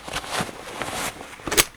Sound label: Inf Weapons Foley; Red Orchestra Ostfront 41-45